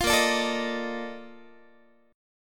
Bb Major 11th Bb Guitar; strummed

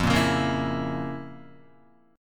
F Major 7th Suspended 4th Sharp 5th F, Guitar; FM7sus4#5 strummed; JGuitar

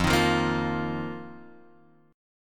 F 7th Suspended 4th F down Guitar Open F; strummed; JGuitar

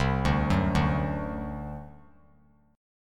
C Suspended 2nd Flat 5th C down; Csus2b5 arpeggiated

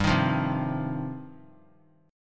G 7th Suspended 4th Sharp 5th; G7sus4#5 strummed

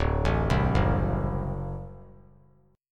D 7th, down; D7 arpeggiated; JGuitar

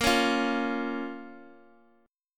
Bb Minor Bb down

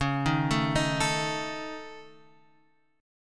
C sharp Suspended 2nd Sharp 5th, C sharp

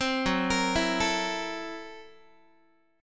F sharp 7th Sharp 9th Flat 5th Guitar, chord; arpeggiated; JGuitar

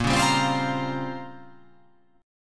A sharp Minor 6th Add 9th A sharp down, chord

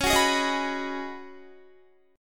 Db 7th Suspended 2nd Suspended 4th, Guitar; Db7sus2sus4 strummed

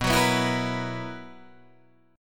B Major 7th Suspended 4th B down Guitar Open G

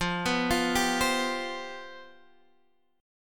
G 11th F

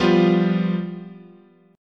F Suspended 2nd Flat 5th, guitar chord

